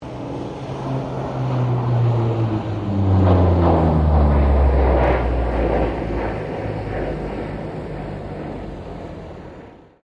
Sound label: AP-3C Orion pass; RAAF Orion; Airplanes; Vehicles